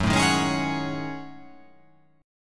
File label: F sharp Minor 6th Add 9th